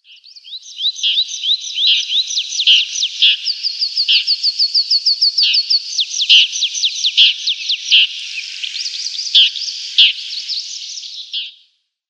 Brown Shrike call

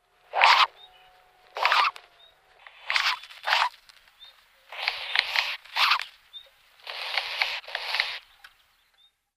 Eurasian Nightjar downy Young

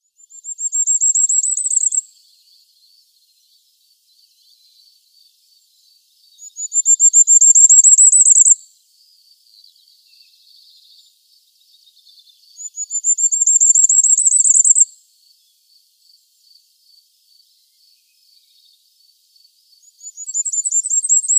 Firecrest song

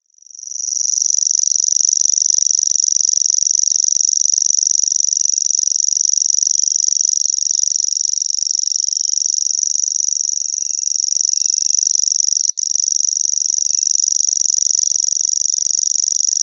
Grasshopper Warbler song

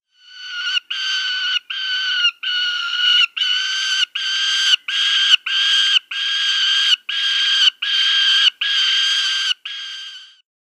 Barbary Falcon nestling

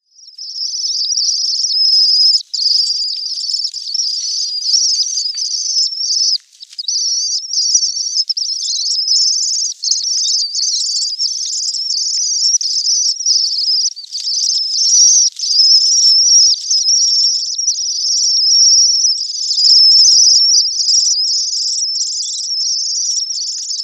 Common Swift nestlings

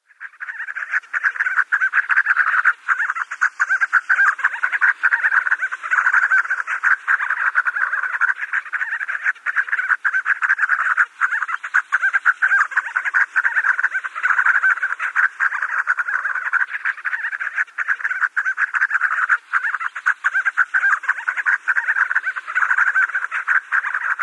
Crowned Sandgrouse

